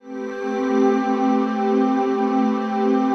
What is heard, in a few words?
TRANCPAD; Best Service; XX Large Pads; Sample CDs